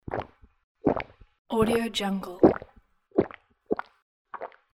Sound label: drinking; audio-therapy